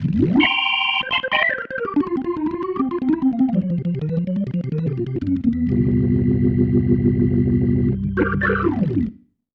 Ending, Organ; Sonic Foundry (Sony Creative Software); Joe Vitale Organ Donor; Endings

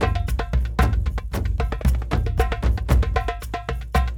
Kendong, World Percussion; Brekete, Shaker, Dumbek

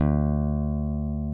P-B PICK D3, BASS; Greytsounds; JAZZ+P BASS

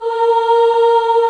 VOI CHOIR; VOICES; Sound & Vision - Gigapack I CD 2

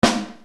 RX7 HEAV SD3, Yamaha RX-7

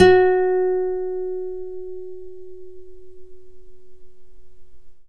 NYLON GTR

